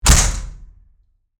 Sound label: Metal Door Slam; fredbear friends missing report official soundtrack 2023